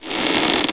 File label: Door open; Ferazel's Wand Demo